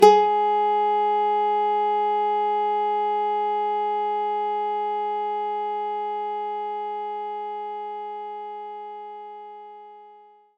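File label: Nylon Guitar G#3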